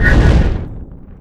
energy sing flyby, weapons; hl2

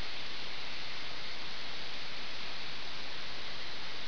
acid, ambience

